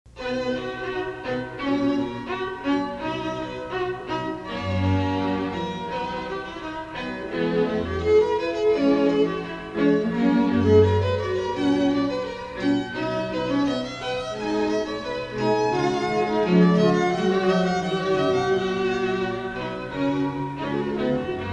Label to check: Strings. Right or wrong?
Right